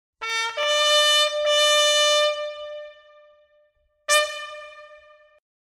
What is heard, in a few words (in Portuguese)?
Descansar, toques